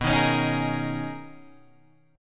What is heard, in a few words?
B Major 13th B Guitar Open G